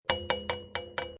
Metal Spoon 5 Hits, Five hits of a metal spoon against a pot.; Kitchen; Household